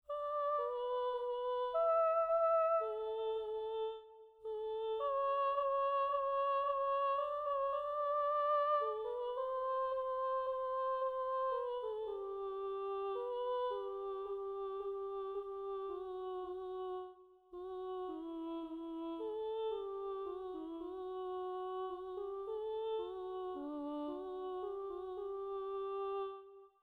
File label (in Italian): canone a due; salieri